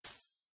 Retro Scifi Hit, One short hit, metallic, high frequency, a button being pressed or a; an operation of a sci-fi machine; Science Fiction Sound Effects; Lynne Music